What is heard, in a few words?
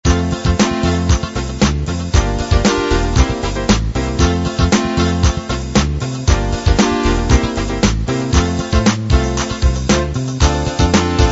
70s soul